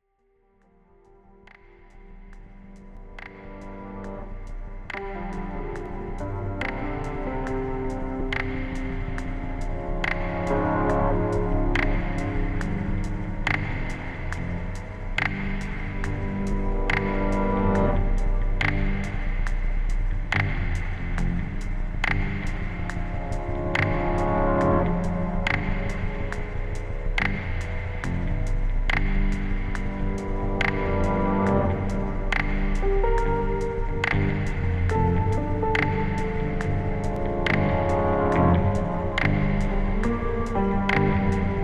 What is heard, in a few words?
lo-fi